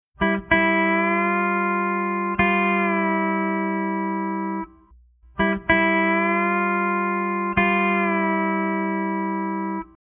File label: lap steel; Lap Steel Guitar Tunings